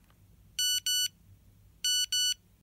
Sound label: SMS ringtone